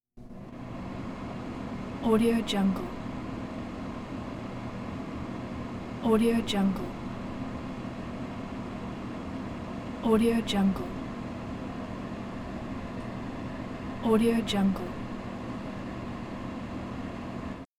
bathroom exhaust ventilation fan

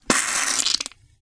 foley gore squish spurt spray squirt; leak blood

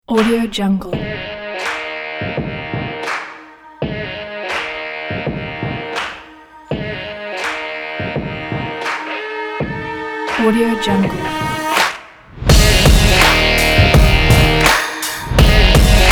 sport and stylish hiphop guitar pack

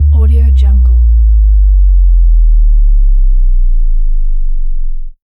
sub bass drop

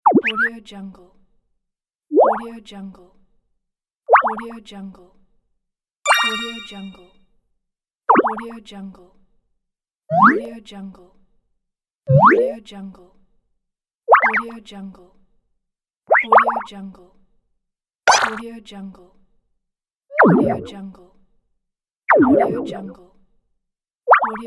cartoon sound; cartoon sound pack

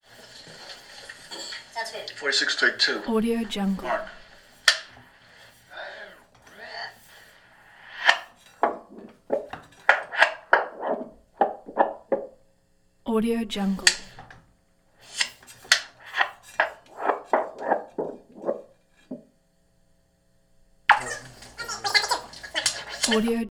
syncing dailies film rewinds popping the slate

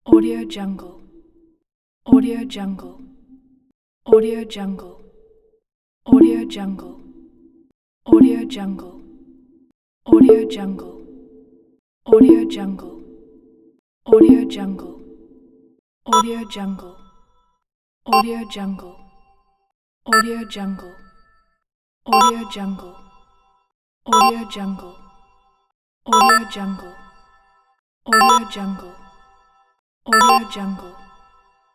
menu selection

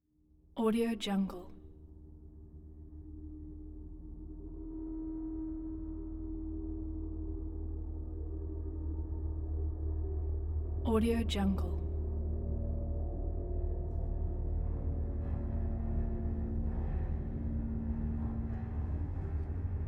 thriller strings ambience

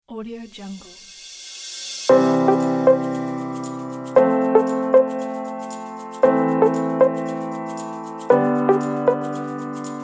corporate; alexmusicmaker

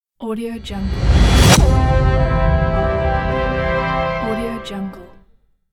presentation intro sound; centralperkproduction

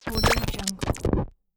glitch

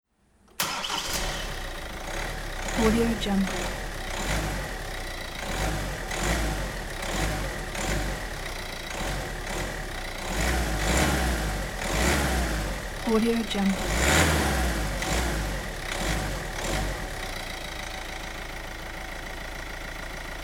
diesel engine revving idle stop

